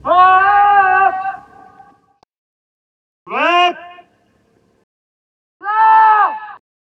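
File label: distant vox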